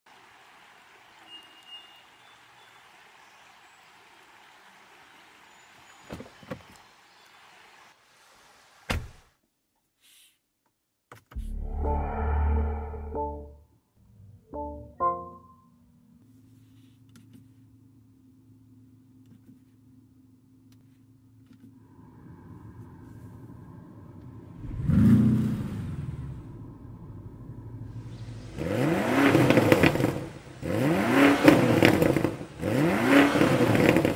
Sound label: Brilliance, موتور برلیانس